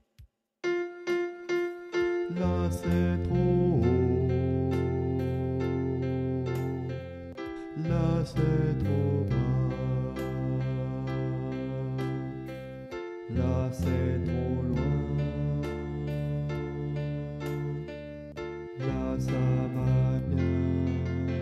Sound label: alto